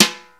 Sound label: BRUSH SD, drum; Crypton - DrumBase5000